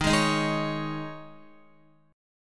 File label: Eb 7th Suspended 4th, Guitar; strummed; x,6,8,8,8,9